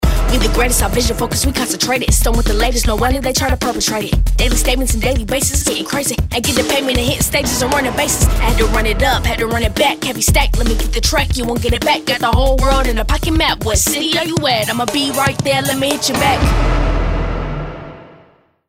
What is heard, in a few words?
Rap